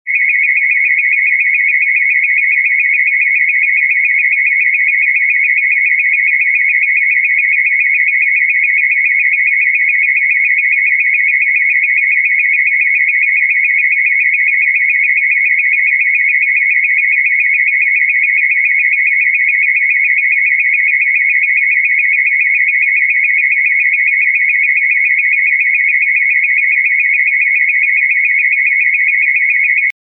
emergency signal, computer

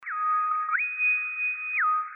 hail all ship, computer

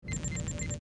computer beep

